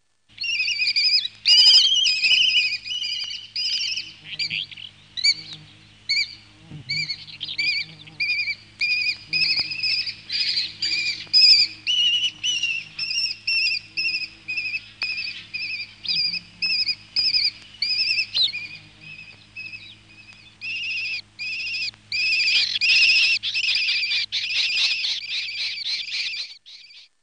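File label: Lesser kestrel; Madarak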